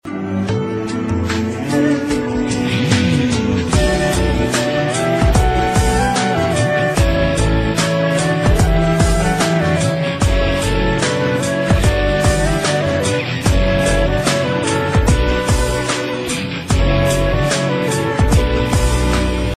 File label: romantic Ringtones